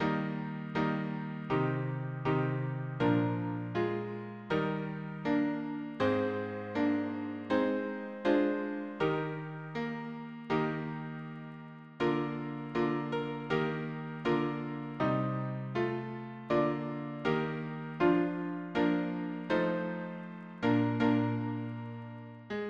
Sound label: harmony piano